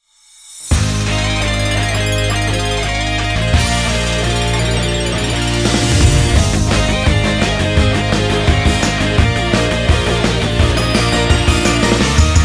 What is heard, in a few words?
instrumental; リズム天国全曲集; ゲームセレクト2